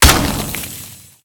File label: large impact; smite; thanatos